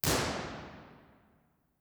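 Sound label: room impulse response, concert hall; jack lyons; university york; b-format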